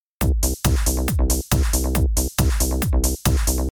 ベース

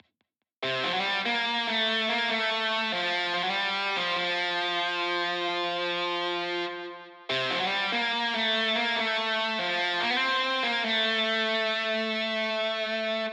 スライドあり

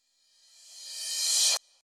リバースシンバル

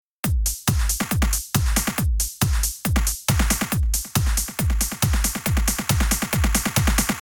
スネア

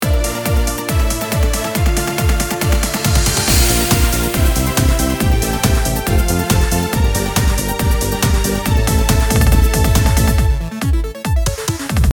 イントロ

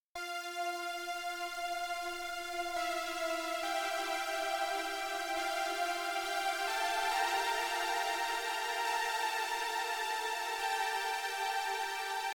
St リリース遅い

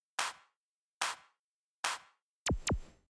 クラップ＆電子音

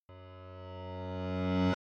リバースピアノ

